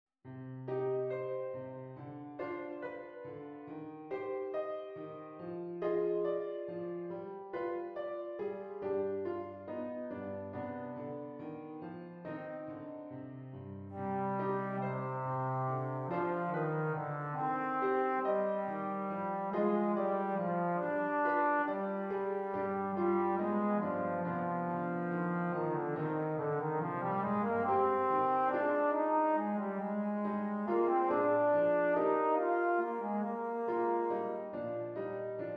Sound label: trombone; bass clef or bb treble clef